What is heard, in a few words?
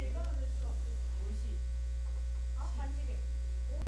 creature